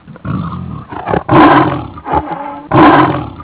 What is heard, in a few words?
tiger